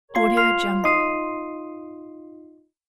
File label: music box magic ding; interface sounds; زنگ هشدار طولانی عملی